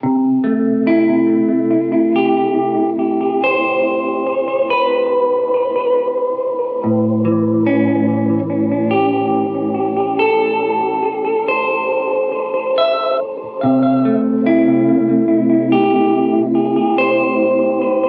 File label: Guitar; Head Space; Heartbreak Vibe; H90